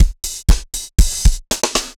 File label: dance floor tight 120, loops